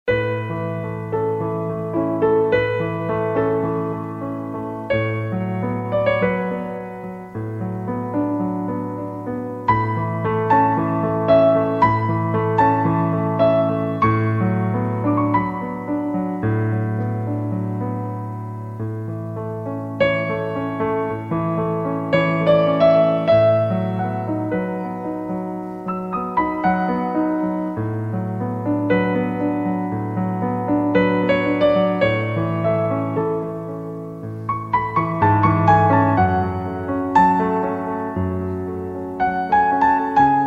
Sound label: piano solo